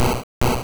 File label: Comet Punch; sfx; attack moves; Pokemon; gen 1; rby